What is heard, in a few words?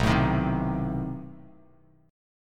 B 7th Flat 9th B down, Bass Standard; B7b9 strummed; JGuitar